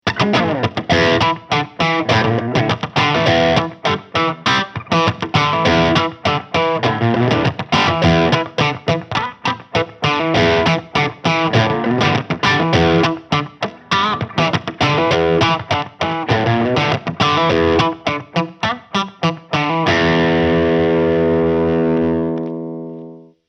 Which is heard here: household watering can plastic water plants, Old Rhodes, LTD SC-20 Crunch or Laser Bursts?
LTD SC-20 Crunch